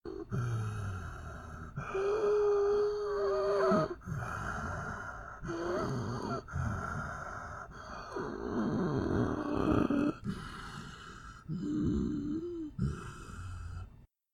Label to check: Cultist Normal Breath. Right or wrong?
Right